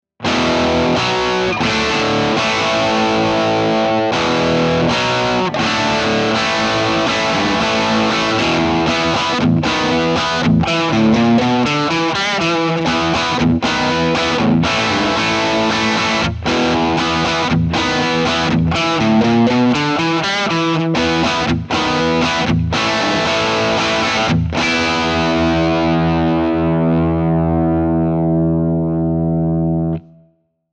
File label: rock chords; jensentone